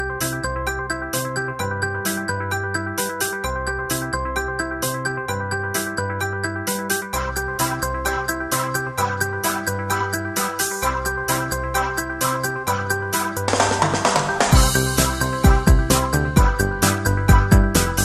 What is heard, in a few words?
Motorola Original Ringtone